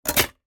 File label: BUTTON Generic Metal Medium High Mechanics; SmartSoundFX